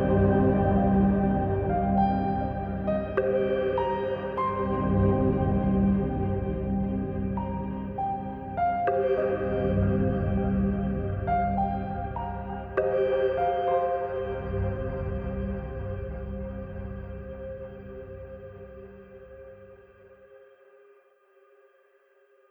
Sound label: Dense Pads; BB 2HTC Samples Vol 4